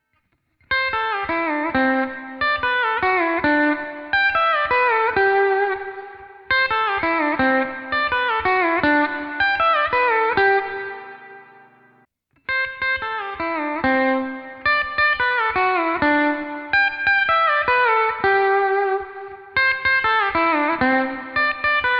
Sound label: E9th BC Pedal Combo